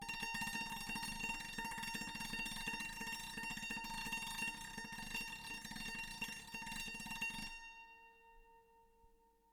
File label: Sant Roll A#5